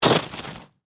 Snow Crunch; Weather; Sound Effects:Weather

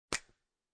Pen Remove Cap

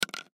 Coffee Creamer; Coffee Creamer Package Empty Set Down Wood Table; Drink Mixing; Foley; Blastwave

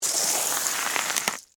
Scrape Pasta Shells; Texture; Scrape Sound Effects; Scrapes (Sound Effects:Objects); BlastWave

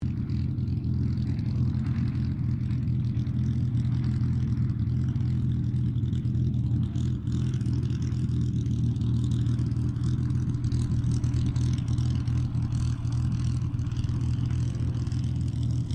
AIRCRAFT SUPERMARINE SPITFIRE MK-XVI TAXI STEREO; Airplanes

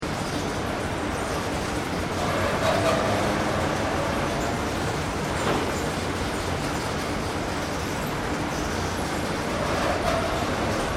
Industrial Conveyor Truck Loader Operating Loop; Various industrial machines; Machinery

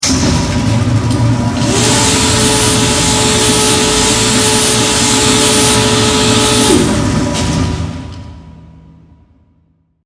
Machine Motor Whine; 5.1; BlastWave

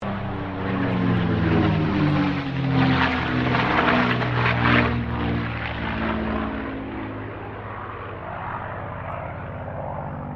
AIRCRAFT CAC CA-18 MUSTANG MK23 PASS STEREO; CA-18 /P-51 Mustang; Airplanes

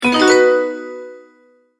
multimedia tone advance

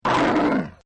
Camel Voice; Camels; Animals; Partners In Rhyme

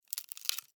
Termite, Bite, Wood, Splinter, Crack, Insect, Bug; Termites; Sound Effects:Animals:Insects; Blastwave

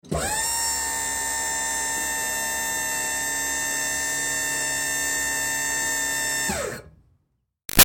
Servo Moving Truck Long; Motors; Machines; BigRoomSound

